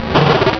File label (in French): Cri